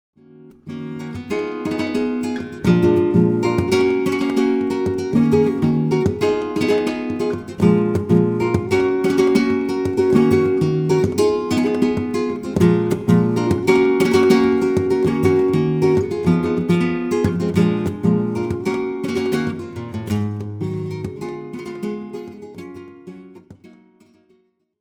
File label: Rumba, guitar